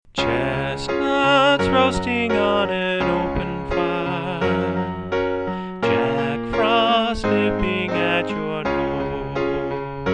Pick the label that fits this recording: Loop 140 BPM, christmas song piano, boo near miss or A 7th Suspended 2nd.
christmas song piano